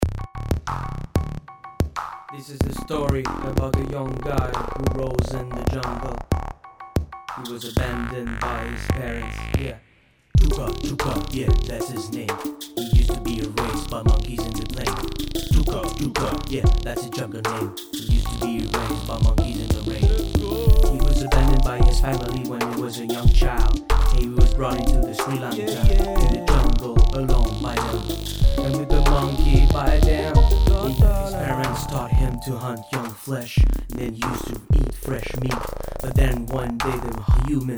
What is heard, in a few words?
Beat; Musique fraоche